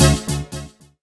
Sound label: jingles